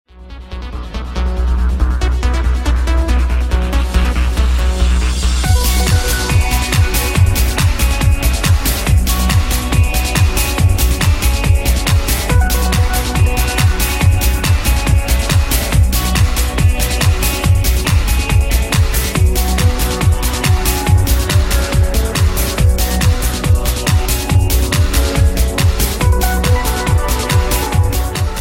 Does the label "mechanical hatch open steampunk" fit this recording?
No